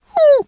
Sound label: weird effort; ice age scrats nutty adventure